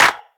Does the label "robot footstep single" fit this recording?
No